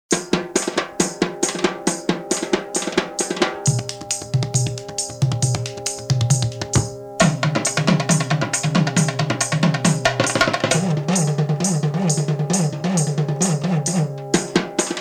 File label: Music Bit, folk